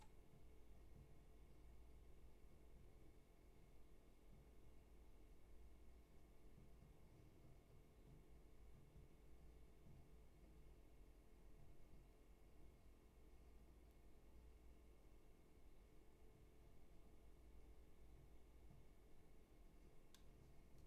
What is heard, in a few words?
room tone